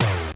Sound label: c64 sid, Commodore 64 8bit SID Drums; LO FI and 8 BIT KITS